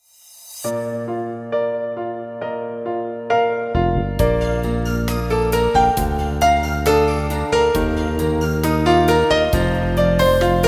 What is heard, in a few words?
piano piano